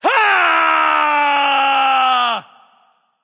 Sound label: battle cry; smite; loki